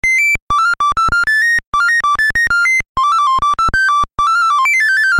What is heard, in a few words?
doot; Carpathian Night demo 2019 Halloween